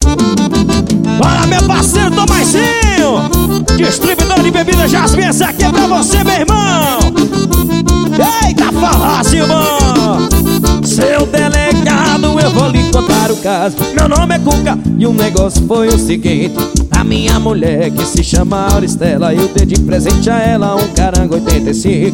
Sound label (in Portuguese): forro xote